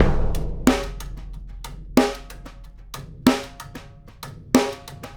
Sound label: Big Drum and Snare